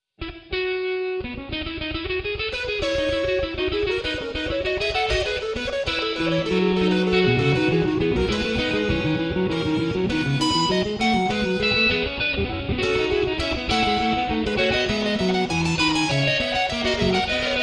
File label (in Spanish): guitarra fuga; bach bwv854 fuga; wtc1